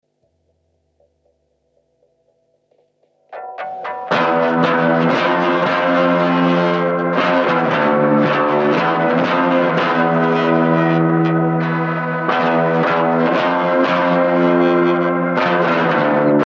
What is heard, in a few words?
clean drop git